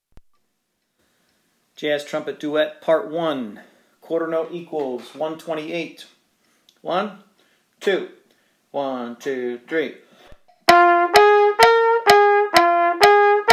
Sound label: jazz trumpet duet; TTN Jazz Duets; Jazz Trumpet Duets! (#1)